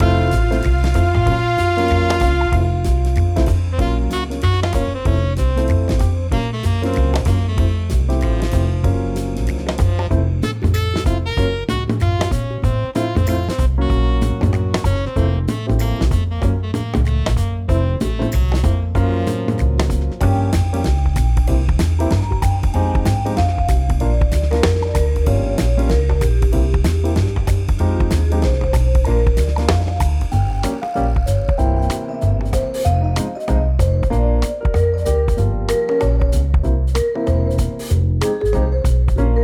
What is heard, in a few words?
Jazz Fruit Salad; Jazz Music Pack Vol. 2